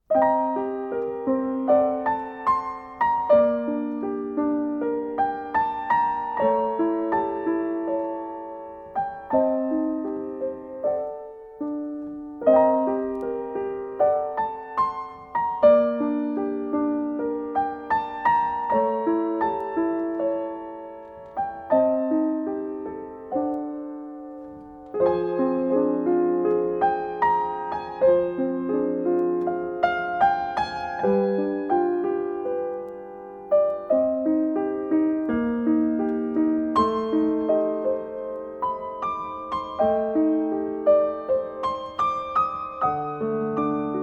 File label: piano solo; muzyka k filmam; pobochnaja tema 2